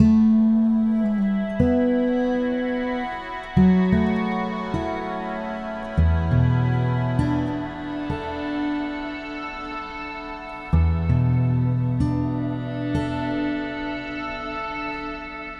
Guitar And Synth

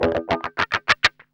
GUI, LICKS

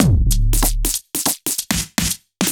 Heavy Swing 95 D, 95bpm; off the grid

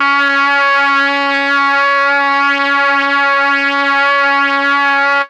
MEL BRASS; ILIO; Propeller Island; Legendary M400

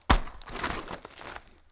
Rocky Step, outdoor, Footsteps

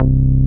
SH101; OLD ROLNDS

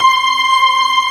P-ORG C6 L, POWER ORGAN

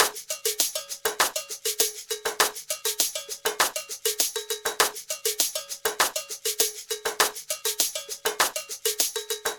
Ensemble, Latin Percussion

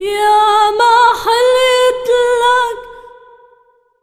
SINGER, ArabianTraditions

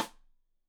Side Stick Stereo; Deeply Sampled Drums

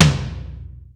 ROOM T, ROOM KITS; Crypton - DrumBase5000

